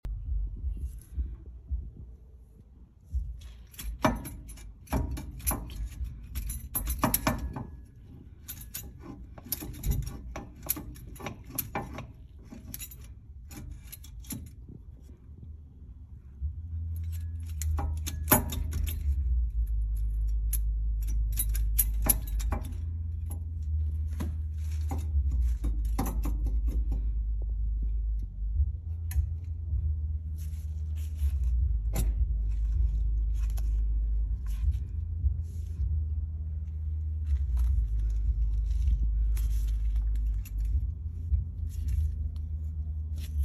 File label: trailblazer hub axle shaft; just brakes